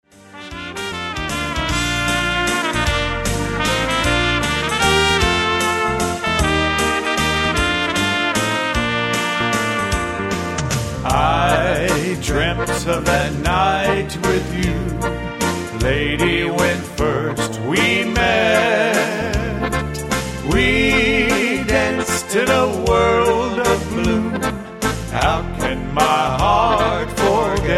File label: waltz